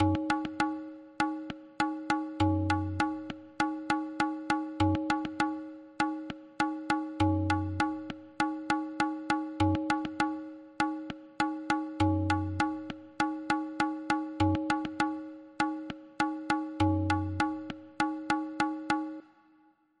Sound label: tabla